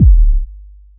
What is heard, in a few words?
long room reverb bass drum c sharp key